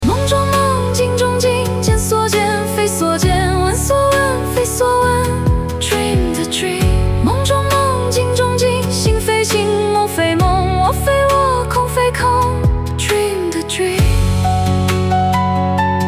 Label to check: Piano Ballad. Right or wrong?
Right